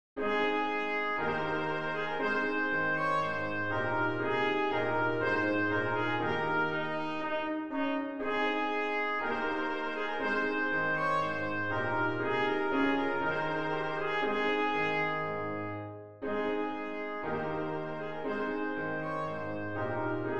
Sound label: trumpet voluntary; clarke jeremiah